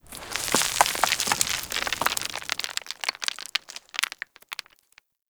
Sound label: Sand, Ambience; Warframe; Grineer Settlement